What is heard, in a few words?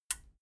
metal button radio push power on